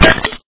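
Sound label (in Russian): на пол бросили охапку дров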